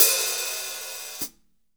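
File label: hat open close, drum; 90s sample CD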